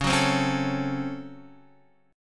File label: C sharp Major 7th Suspended 4th Sharp 5th C sharp Guitar Standard; C#M7sus4#5 strummed; JGuitar